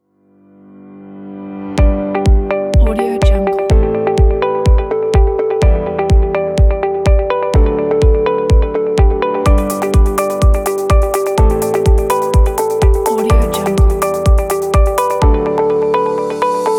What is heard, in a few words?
upbeat corporate dance